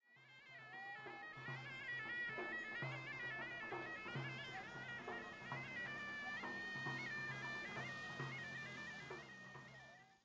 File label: snake Charmer; wild bazaar